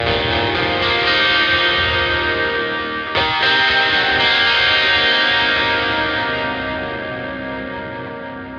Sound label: Lake Hi Gtr E flat 9; torchlight iii; ps4